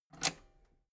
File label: metal button robust spring push enable